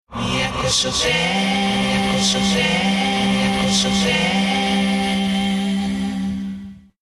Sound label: jingle